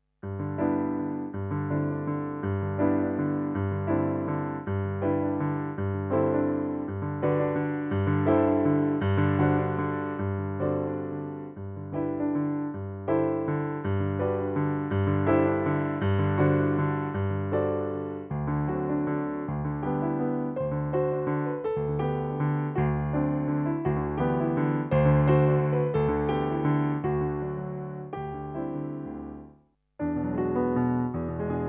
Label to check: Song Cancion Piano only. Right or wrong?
Right